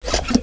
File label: Pump; zombie amxx ru